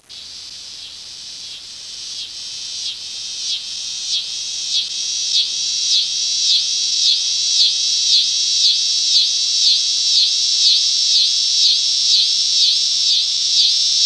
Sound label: pruinosa, Michigan Cicadas